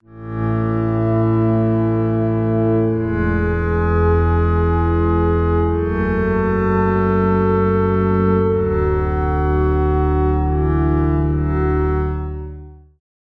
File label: PAD Melancholic Scenery; Phasewaver; syntheway